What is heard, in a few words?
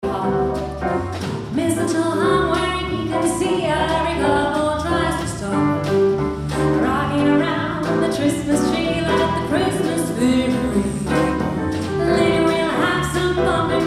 jazz; Covilhã